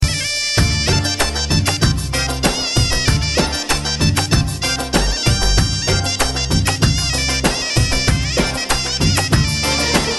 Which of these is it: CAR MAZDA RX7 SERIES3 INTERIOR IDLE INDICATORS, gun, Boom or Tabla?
Tabla